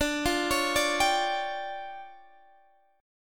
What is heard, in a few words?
D Minor Major 7th Double Flat 5th, D, Guitar Standard; DmM7bb5; x,17,15,18,15,15; arpeggiated; capo=2